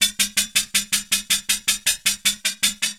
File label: HIHAT, Drum Bass, 160; eJay